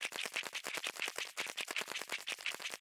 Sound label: flip hopper crawl loop